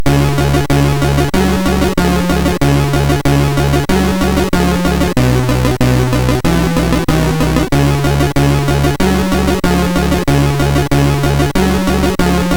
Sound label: Arabian, MOS6581R3